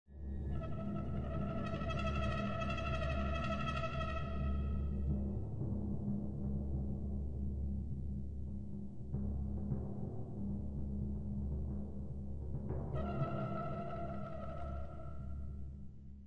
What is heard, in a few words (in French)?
cuivre